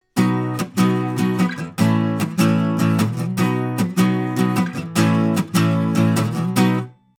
Warm Audio WA 67 Guitar Strumming Omni 20cm

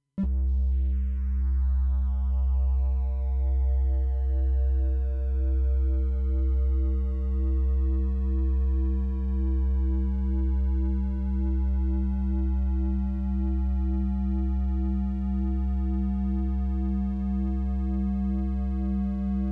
TRI Waveform mod

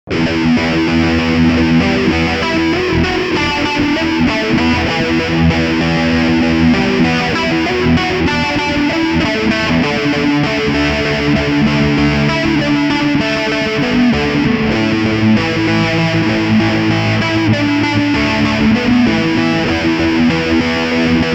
Mod Filter; Soldano

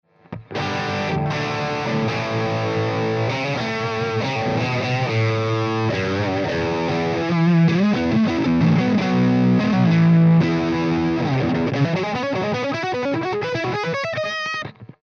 Strat high gain boost; BRUNETTI Ch2

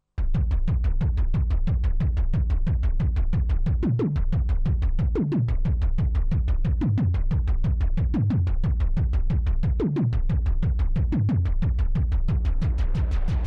Instant Beat

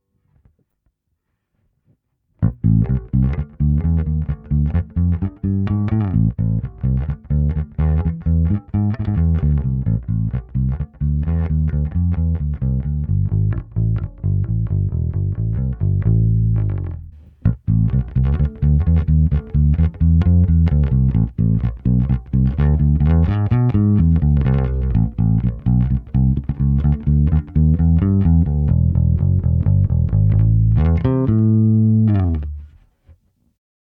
Ampeg Opto comp Fingerstyle

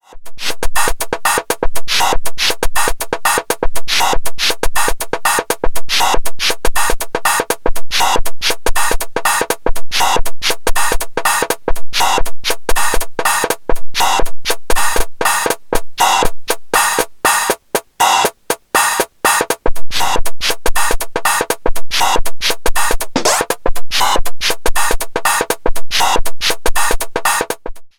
Erica Synths Perkons HD 01 Voice 4 Mix Shuffle

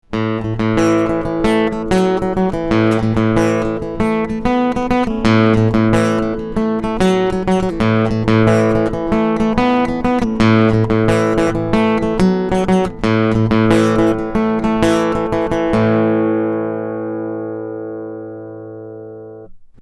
Calima Git

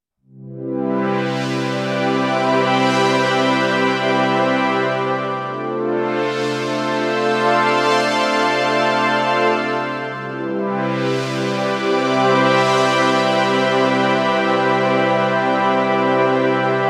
Korg KROSS 2 Synth Combis

